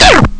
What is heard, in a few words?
scratch; ST-XX Amiga Soundtracker Sample Packs